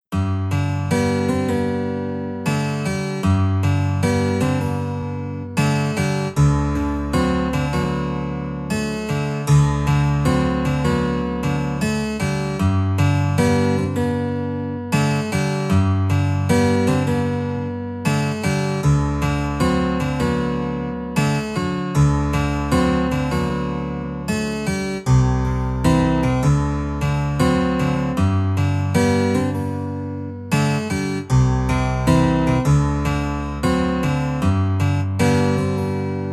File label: hawaiian guitar, 432 Hz; make a wish; Wypowiedz życzenie; Grota solna